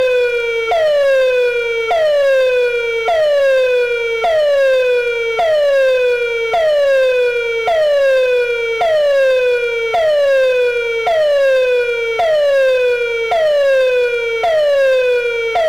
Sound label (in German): ddr sirene